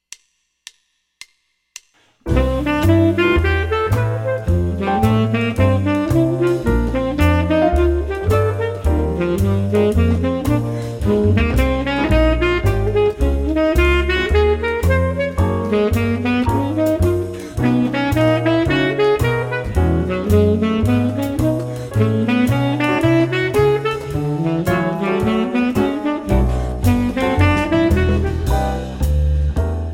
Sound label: Scale